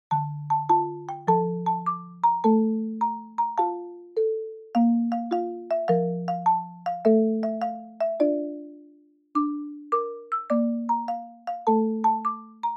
Shuffle

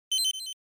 NES RPG Select

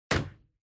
Motion Slam Light

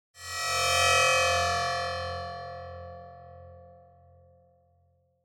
Cyber

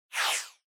Motion Agility Low; モーション 俊敏; 低

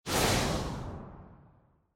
Camera Motion Mid; シーン・カメラモーション

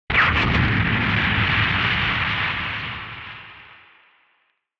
Retro Anime Explosion Low Long

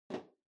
Power Strip Far Put

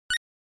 NES Fighting

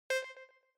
Countdown

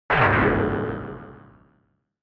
Retro Anime Robot Long

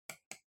PC Mouse Far L

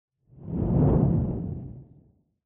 Camera Motion, Low

